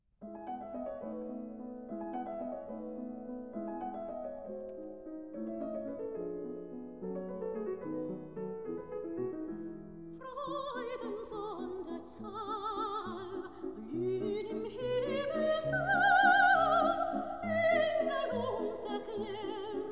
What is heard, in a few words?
song for voice & piano; Seligkeit ("Freuden sonder Zahl"); ("Minnelied"), D. 433; Schubert; Lieder